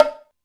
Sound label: Low Bongo, Alesis D4 drum machine